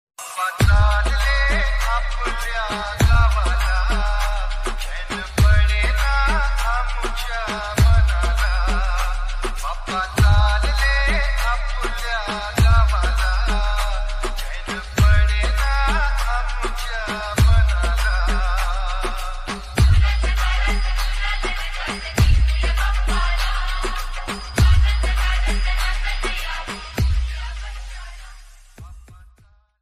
dj sound check

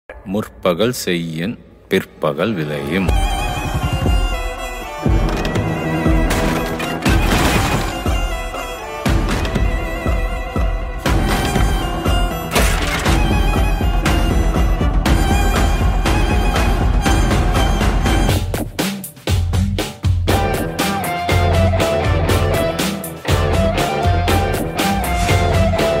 bgm ringtone; selvaraghavan; pagasuran

